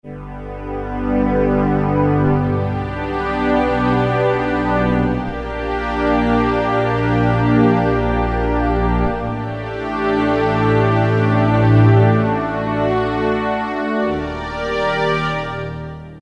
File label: Planetary Strings, Roland PN-D50-03